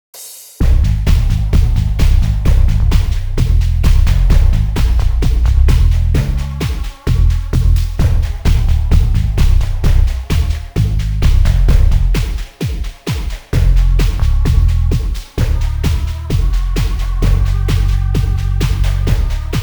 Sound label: loop; martysonic